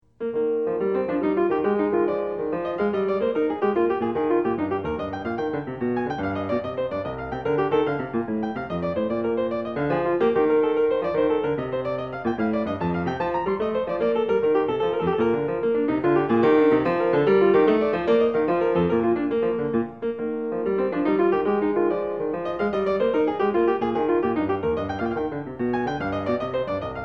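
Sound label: courante, piano; bwv 815; French Suite 4; BACH; FRENCH SUITES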